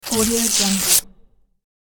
tearing paper

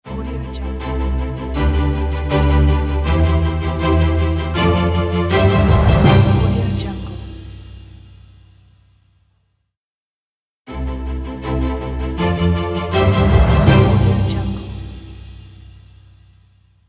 achievement logo

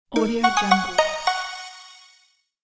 chinese ding next level

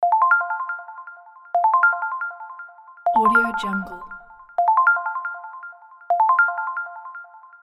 soft ringtone loop

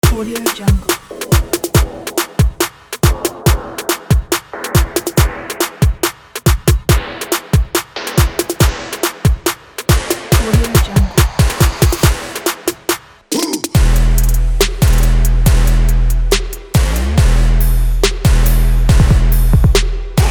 rap

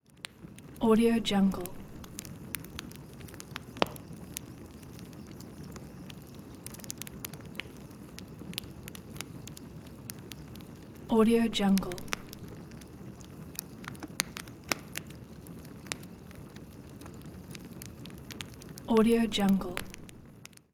fire

